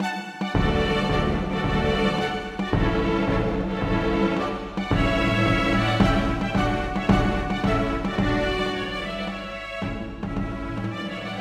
Marsch for strykekvintett og ad lib trommer, m trommer

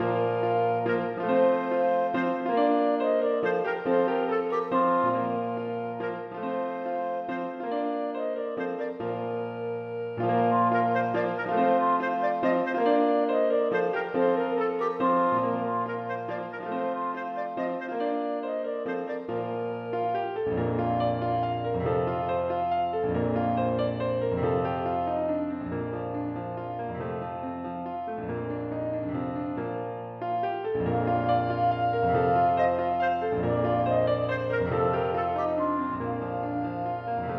Menuet for klarinett og piano; Aamodt 014g

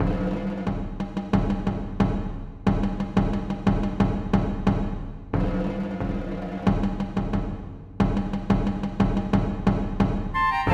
March for fløyter, strykere og trommer; Aamodt

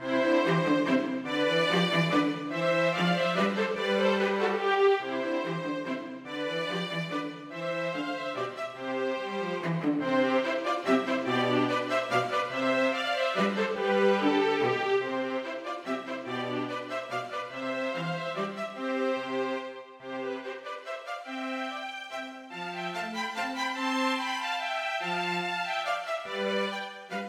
Menuet for to fioliner og cello; Aamodt; Enklere arrangementer

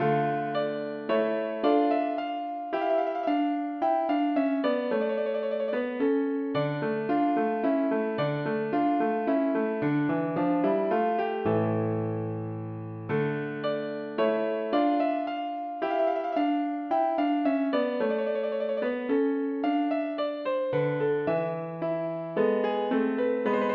Menuet for piano; Aamodt

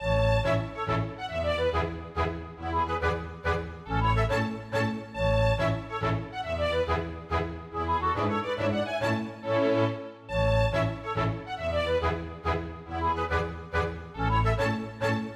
Polka Masurka; storgårdsmusikk

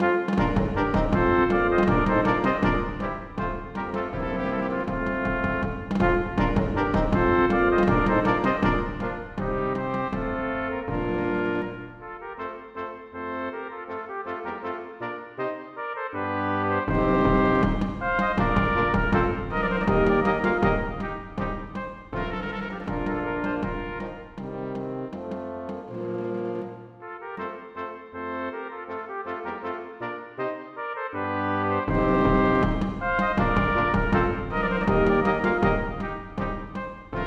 Arie for messingkvintett (ad lib trommer); Aamodt

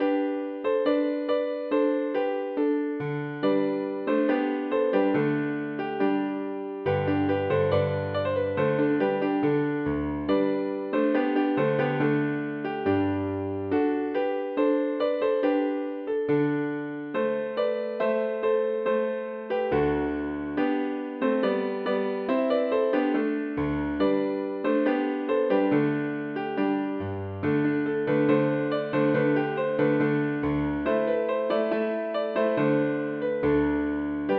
Nu velan for piano; Bang

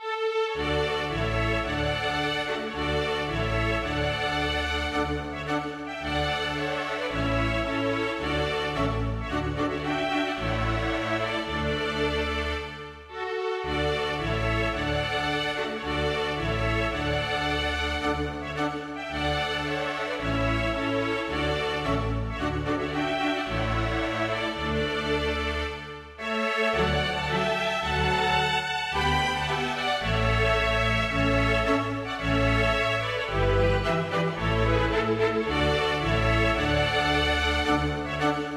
Marsch for strykekvintett u. trommer; Hertz

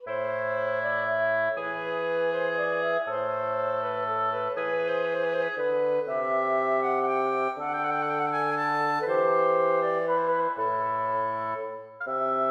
Rondeau for blåsekvartett uten cembalo; Hertz

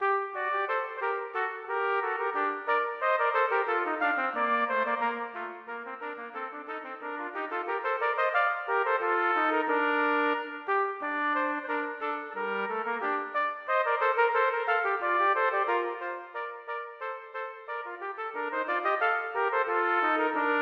Gavott en boure for trompetduett; Hertz

